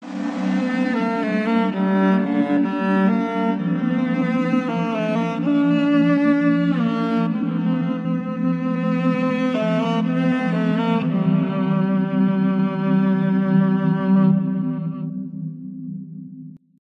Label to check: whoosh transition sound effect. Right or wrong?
Wrong